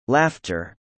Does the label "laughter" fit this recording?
Yes